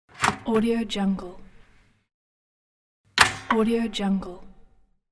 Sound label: toaster pressed down and pop up